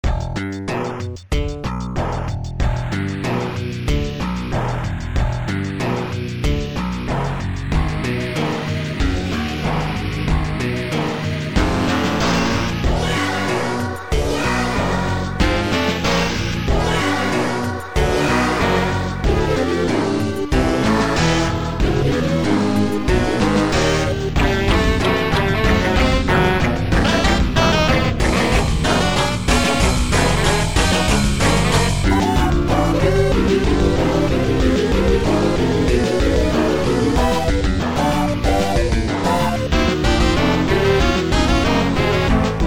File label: THE BELLS